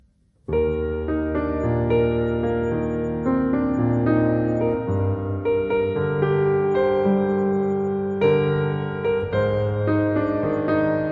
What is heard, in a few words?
Piano and Cello